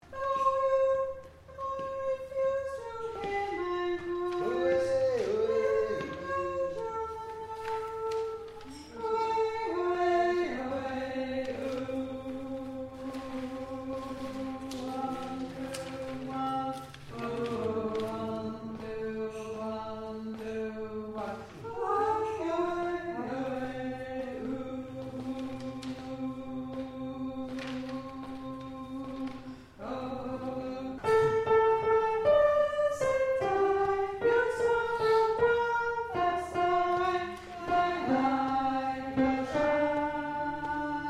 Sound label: alto